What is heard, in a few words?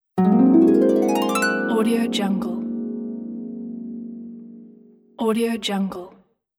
fairytale harp